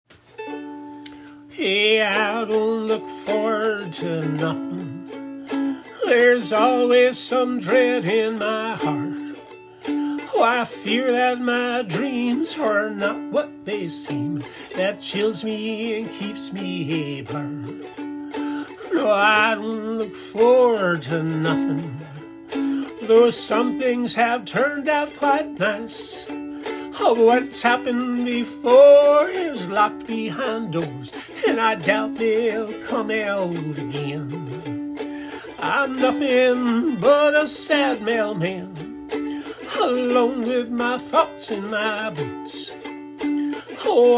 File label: sad waltz